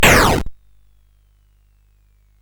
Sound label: Frantic, CSG8580R5